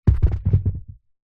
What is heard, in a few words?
wood small heavy dense piece roll on carpet; foley